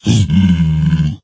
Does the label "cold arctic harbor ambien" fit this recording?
No